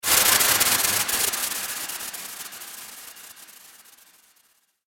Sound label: Static Ghost Charge; Ghost Sound Effects; horror sound effect; Andrew Fleming; Twistedtracks